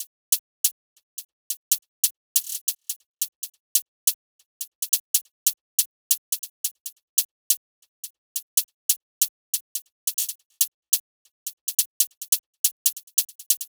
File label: Sturdy Hihat Loop - 140 BPM